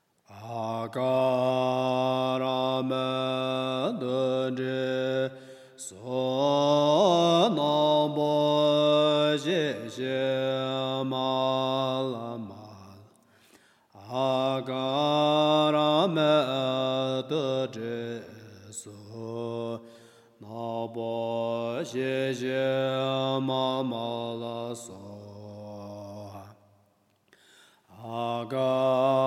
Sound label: mantra nirmanakaya